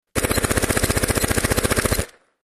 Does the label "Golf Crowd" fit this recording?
No